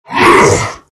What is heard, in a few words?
grunt; Terrorblade